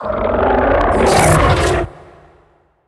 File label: beast vocal pull in close